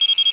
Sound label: Alarm Ejected; XWing